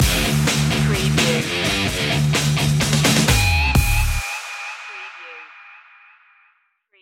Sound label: club metal stinger